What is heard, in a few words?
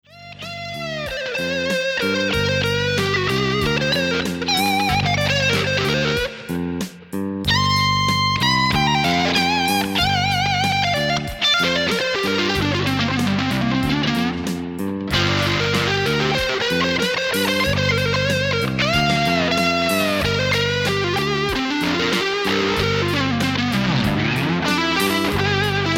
Blues